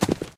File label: Horse Footsteps; Legends